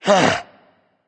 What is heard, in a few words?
sigh berserk; cuchulainn